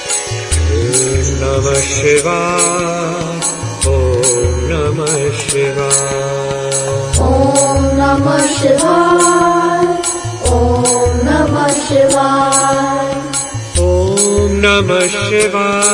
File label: om namah shivaya om namah shivaya duet chant